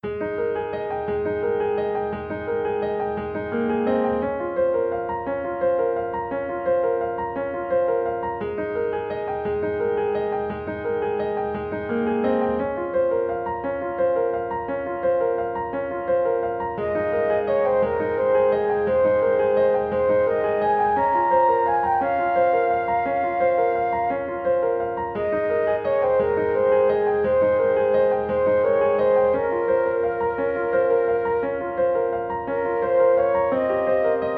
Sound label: bgm